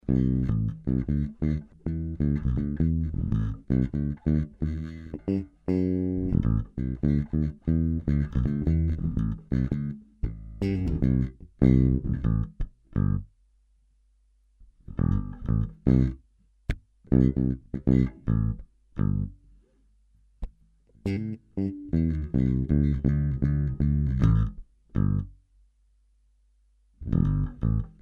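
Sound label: maple G3 all pickups, Gibson bass; maple 76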